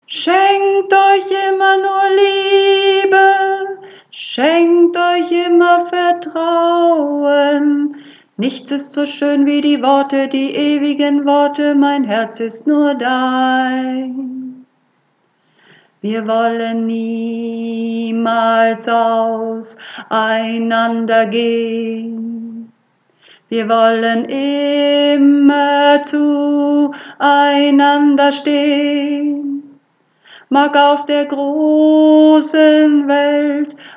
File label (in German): Alt, Uebungsaufnahme